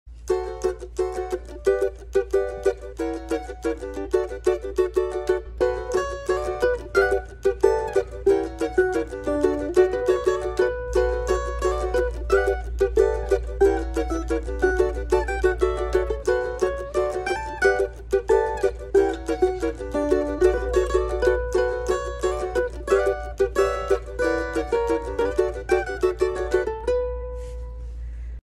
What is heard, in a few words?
Cavaquinho; کاواکینیو